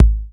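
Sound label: BD, drums; East West; Global Sounds; SPD11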